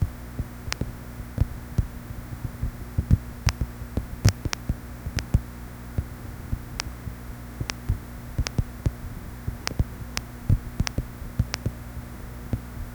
slow static dripping tap; ambiance

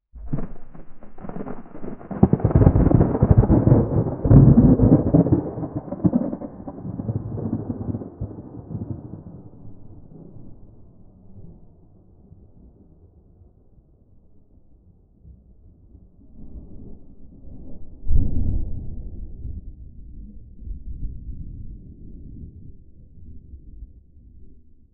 thunder in an open field

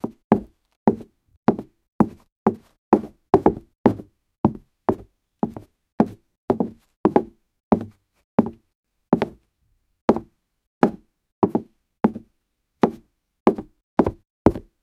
sequence of light footste

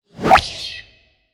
funny swoosh or comic spa, comedy

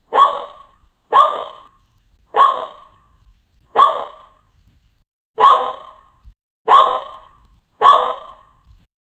deer sound

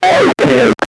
a shotgun sound, christmas

